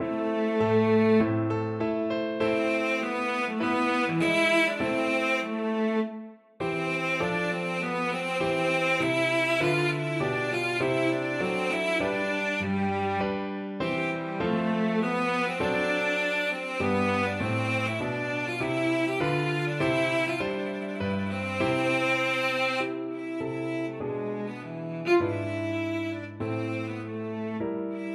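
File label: violoncello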